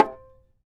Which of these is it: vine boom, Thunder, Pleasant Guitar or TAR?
TAR